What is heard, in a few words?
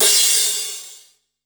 CRASH, Drum & Bass, 160 BPM; Ueberschall; Drum & Bass Resonance